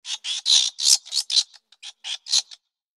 giant rat battlecry; npc

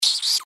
giant rat injured; npc

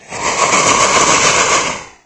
breath; doom3; archvile

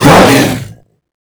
hurt; morbus

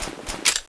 clip out; tfa cso; fnp45

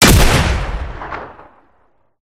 gunstand shotgun fire, turret; gow; turret judgement

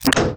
metallic load

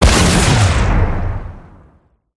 rocket explosion; gow; silverback

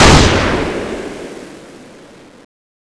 scar fire; cso; scar oz

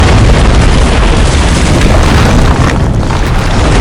wind tremor loop

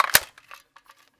ak47 clip in

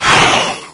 chatter combat; doom3

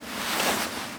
foot slide; nmr zomb shared

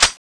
reload; tfa cso

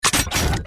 jetpack drop, power armor; powerarmor t51

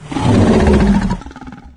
chatter; cyberdemon

